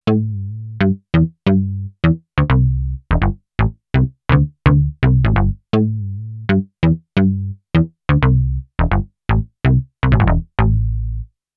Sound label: Elektron Digitone Bass; Early Bass